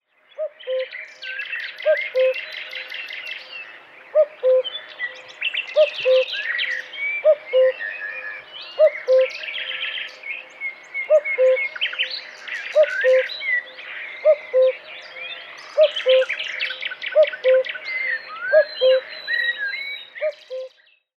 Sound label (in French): Coucou gris, les oiseaux